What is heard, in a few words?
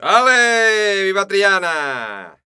Flamenco, Vocals, Male